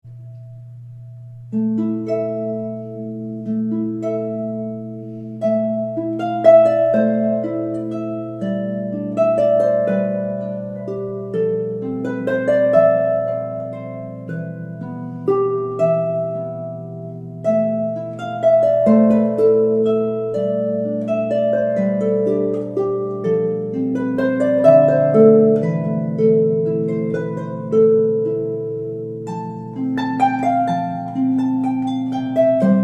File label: Aeolian Meditation, harp; harp lesson audio pieces; Harp Pieces for Chanah Ambuter's Students